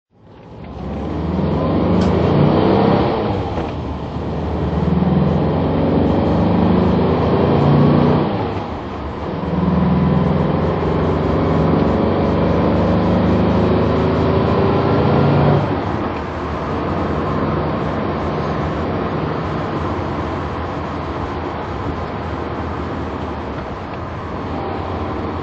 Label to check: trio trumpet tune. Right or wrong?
Wrong